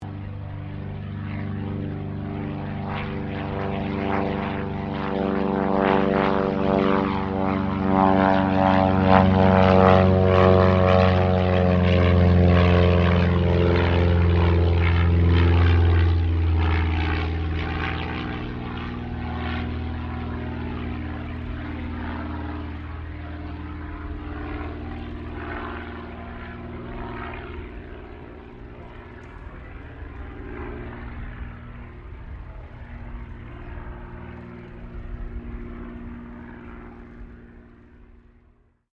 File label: Aircraft CT4A Takeoff, RAAF CT4 training aircraft; Airplanes; Vehicles